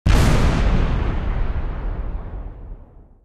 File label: Tomahawk Explosion